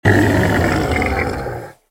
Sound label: zombie transform